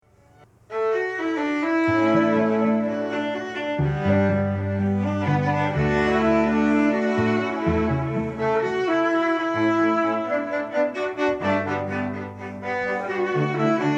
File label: quartet; garage band